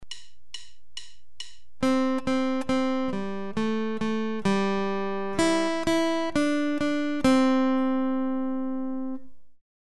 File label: Simple melody, guitar